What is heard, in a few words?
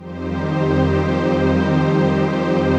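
trance pad; Best Service; XX Large - Pads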